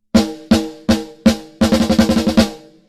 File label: snare Big Fat Snare; SplitEQ